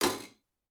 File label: Metal Footfall; soundscrate